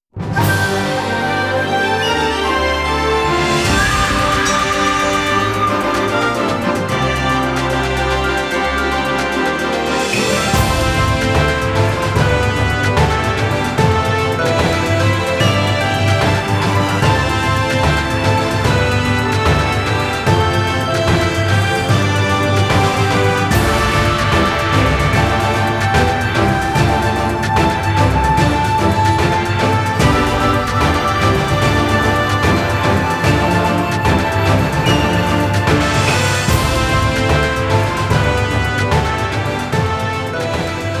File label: victory; Sengoku Basara 3 Original Soundtracks